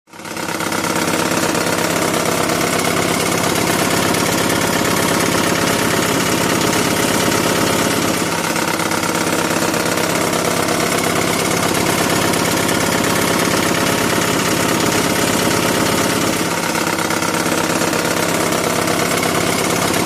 boeing stick shaker

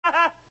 laugh short; pee wee

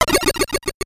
end stage despawn; megaman 4 5 6

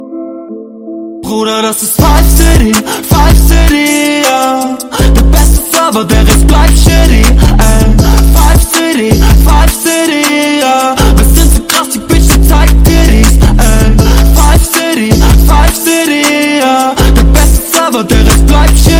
bass; fivecity

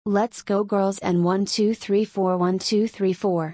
cheerleader

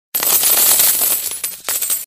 tip sound medium; chaturbate

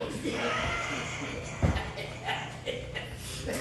major wheeze laugh

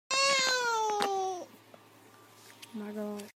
ooooowoooowo

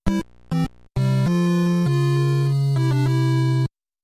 game over; oh no why mario

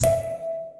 startup disc sound effect; wii

